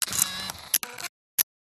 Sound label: electronic camera lens movement